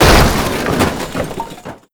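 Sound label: suburban crash impact distant; Call of Duty Modern Warfare; physics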